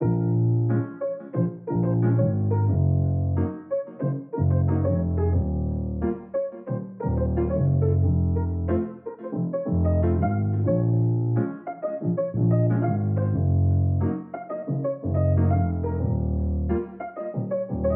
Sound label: Piano Groove